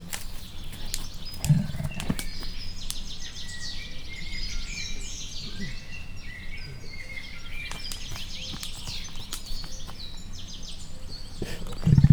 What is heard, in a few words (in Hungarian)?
mocsaras területen könnyebben haladnak, teve is, dávid szarvas; miskolc zoo